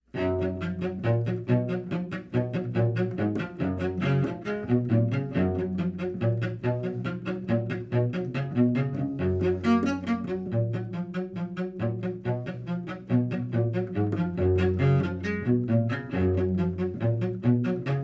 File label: Contrabass; Assassin's Creed Syndicate